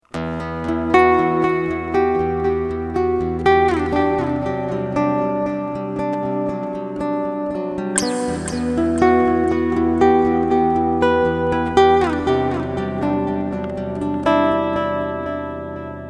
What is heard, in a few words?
Silent Guitar Delay